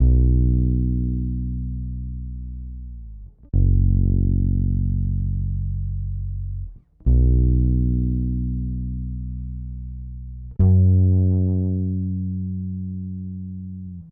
Bass, 068 Bmin

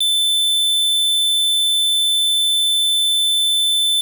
square, 808, SubOsc

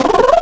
Guinea Pig, Animals Wavetables; Cymatics; Serum; Animals for Serum - Gold Edition

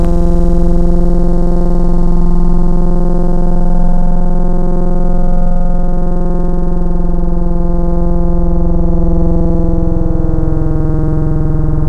Scream; Cymatics; Wavetables Collection Vol.1; Serum

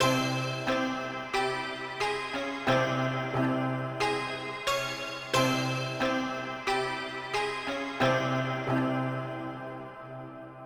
Synth, 090 Cmin

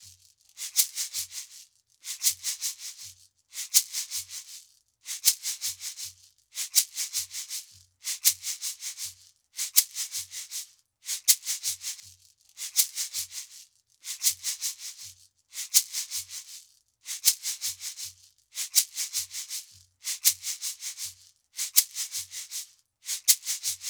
shaker A 080, Hip Hop India, 080bpm; Hip Hop India Modern Percussion; Apple Loops

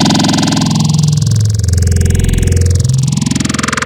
Growl Table, Serum; Cymatics

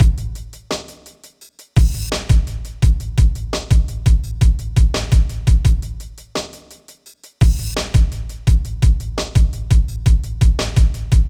Drums, Hip Hop, 085, Amin

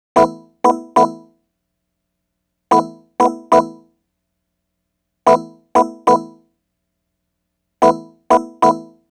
Synth

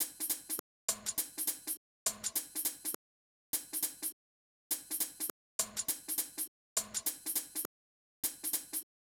Percussion B, Hip Hop Exotica, 102 Emin; Apple Loops; Big Fish Audio

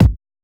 Kick Hit

